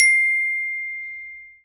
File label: Bell C4